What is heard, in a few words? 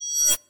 Mantis Blade Start